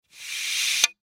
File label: Curtain Rod Slide, Curtain Rod Slide Metal; Windows; Household; Blastwave